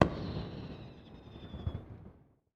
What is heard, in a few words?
hangar; War Thunder